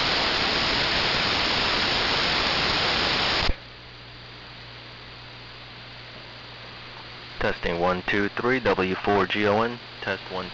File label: 6C4 add Tone Test; W4GON